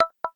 Letter; Honor; 600 Pro China

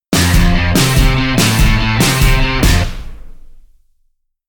teen kicks; Marshall London KB 1501

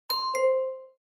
Remind, notifications; Gome; U7

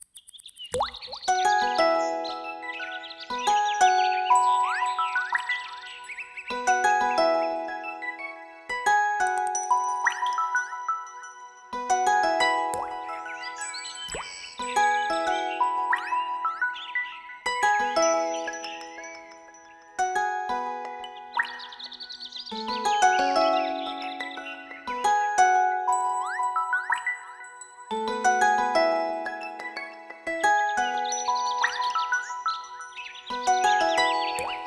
Forest, alarms; Lenovo; Vibe Shot

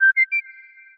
Human Whistle; Tecno Pop 9 4G

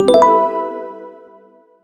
confident, notification tones messages; BlackBerry OS-10

